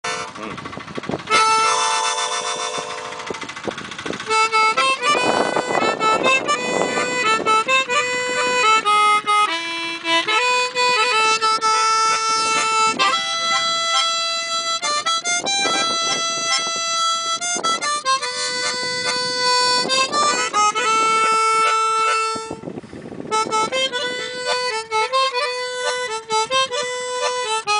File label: Harmonica